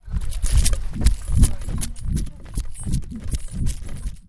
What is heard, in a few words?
keys; corby; fermynwoods; eastcarltonpark